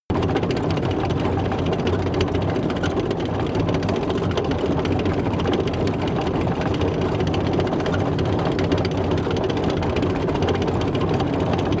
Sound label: tank small tracks rattle medium